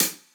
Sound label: Hi Hat Close